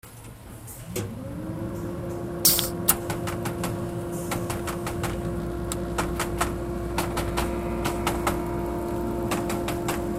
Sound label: Industrial machinery noise